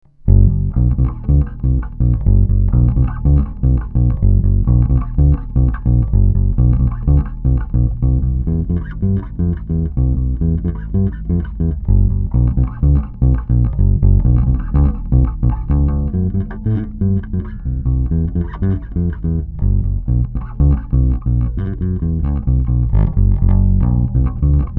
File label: bass recording DI